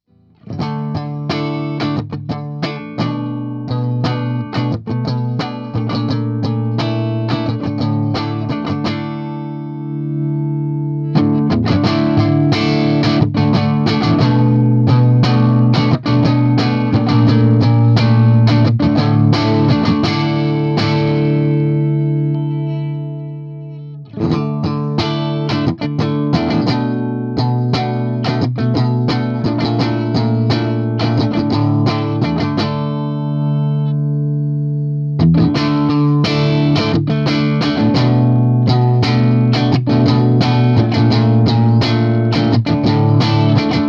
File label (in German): Angezerrt